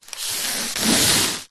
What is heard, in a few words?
foley balloon foil small inflated rub scrape on carpet